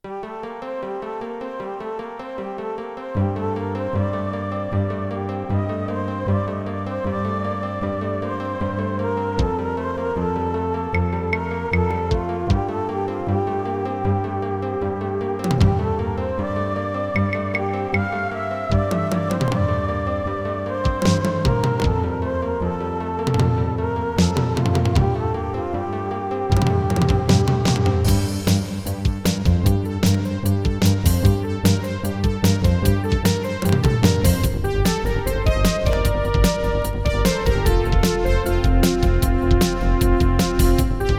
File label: intro roland lapc; Roland LAPC-I; soundcard test; silpheed